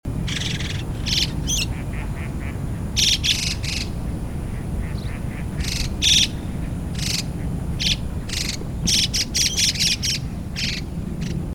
White cheeked Starling Spodiopsar cineraceus, white cheeked starling call